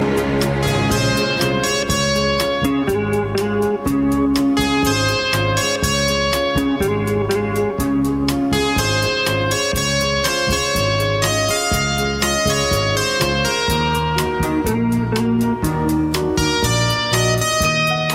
Cinema Melody

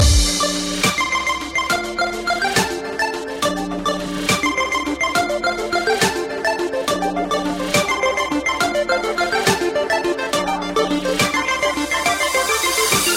Dubstep

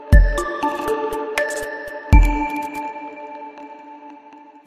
techno Text; Tron

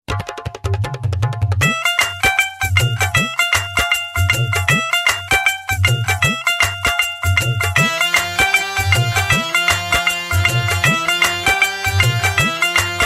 Tumbi

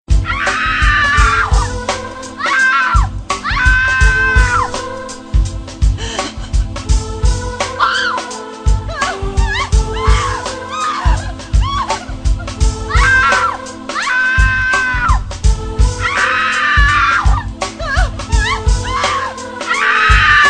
Woman Screaming

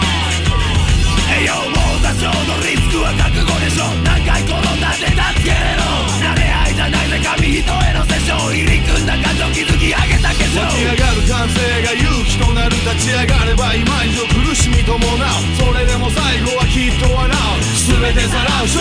Japanese Soft Rap

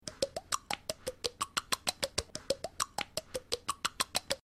Annoying Cluck

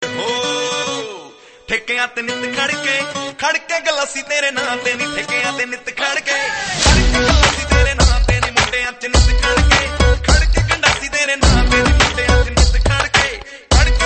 Nice Bazz Tone

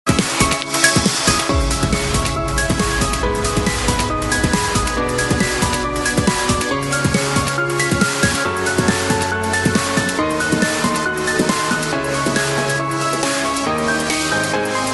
sms ringtone trance

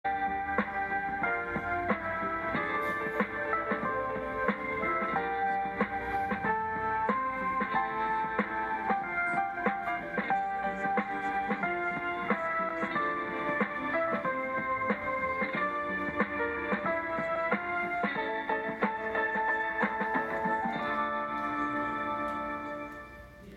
Hold Music; Verizon

